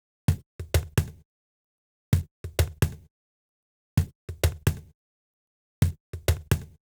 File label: Prcdrm Loop 125; Equipped Music; Loungin House; Perc Loops 125